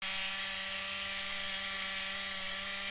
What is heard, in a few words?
TOY ROBOT MOVE